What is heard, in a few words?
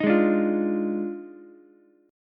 D sharp Major 7th Suspended 2nd Suspended 4th, Ukulele; strummed; JGuitar